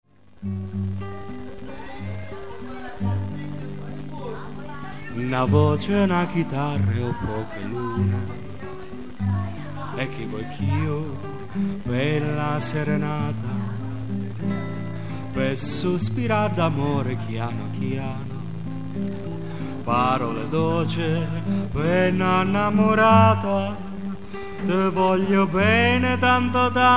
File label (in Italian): na voce na chitarra